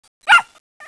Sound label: bark